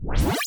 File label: Charge Up